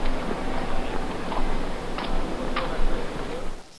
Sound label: house mid; Caesar III